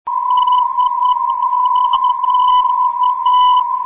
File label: 343khz; cyp lbn syr ndb; dka